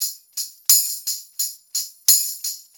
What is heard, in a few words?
Brass Tamb, Perc Loops; Drum Tools